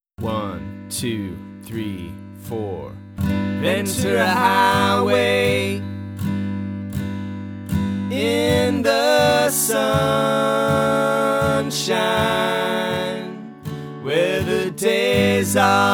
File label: 80bpm